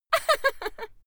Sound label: female laugh short